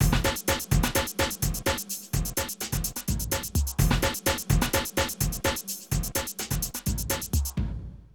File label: Drum loops, Rave & Hardcore